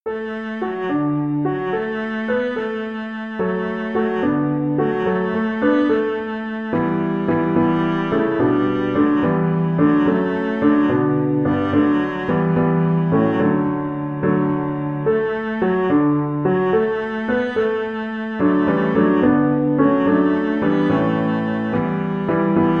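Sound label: violoncello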